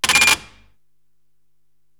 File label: Register Talk, Korg TR-Rack drum machine